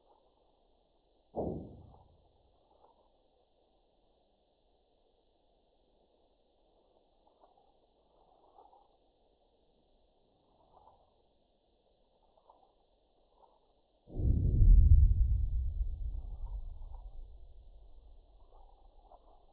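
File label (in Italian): sonificazioni eventi sismici; Piedimonte Matese Magnitudo 4.9 Profondita 10.5 Km 29/12/2013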